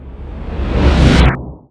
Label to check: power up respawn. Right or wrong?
Right